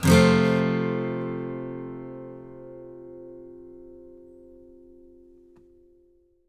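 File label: acoustic guitar F major; Sampleheads - Whole Lotta Country 1 & 2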